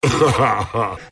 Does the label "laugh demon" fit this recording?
Yes